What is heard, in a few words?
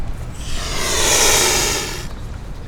Cygnus olor hiss